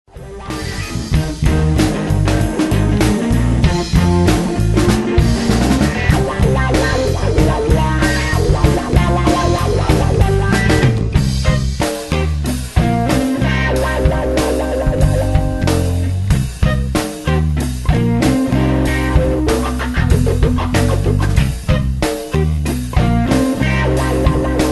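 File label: jungle beat high, Music; 239 Productions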